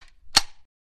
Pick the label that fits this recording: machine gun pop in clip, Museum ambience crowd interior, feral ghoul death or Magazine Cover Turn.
machine gun pop in clip